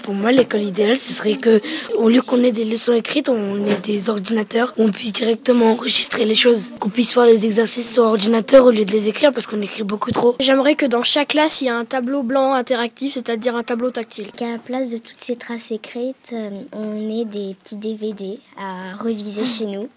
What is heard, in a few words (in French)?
Techno